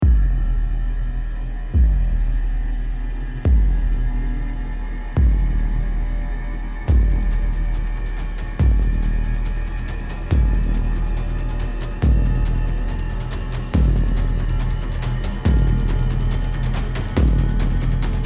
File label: bomb planted; music